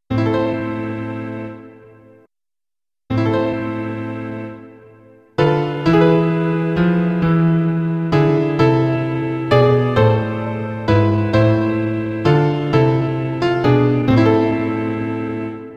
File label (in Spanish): larghetto vivaldi, flauta travesera